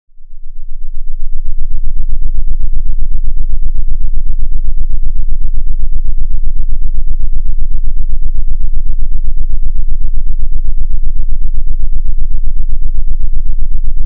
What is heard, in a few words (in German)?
Schuhmannspektrum